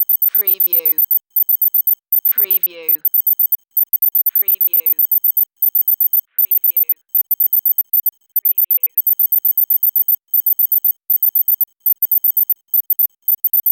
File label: Computer Readout